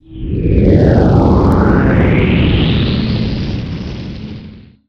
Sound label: spaceship; SuperTuxKart; introcutscene2